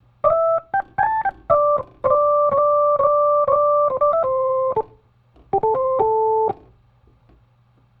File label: Organ, 70s Analog Funk